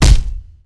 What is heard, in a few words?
Hit Knight; Civ4 warlords